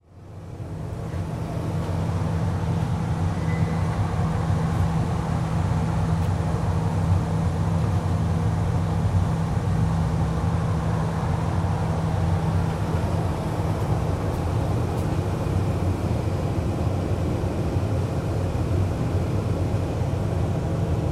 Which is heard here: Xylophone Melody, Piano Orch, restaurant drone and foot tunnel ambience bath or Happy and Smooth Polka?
restaurant drone and foot tunnel ambience bath